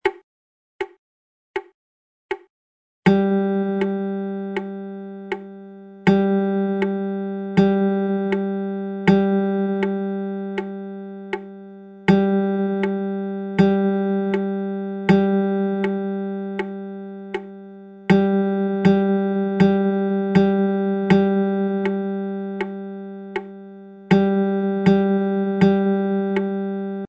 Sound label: rhythm chart, strumming